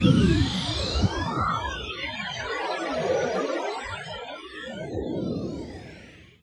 space scanner

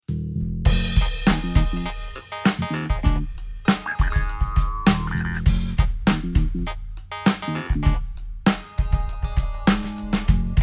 ticky clav

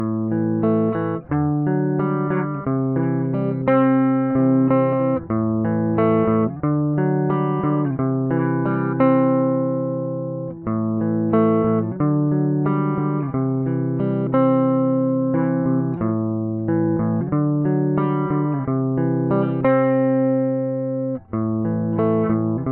ballad dry no effect

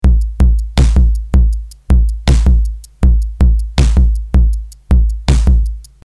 drums computer smashed

